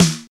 crunchy snare d sharp key, Royality free acoustic snare sound tuned to the D# note; snare drum samples; one shots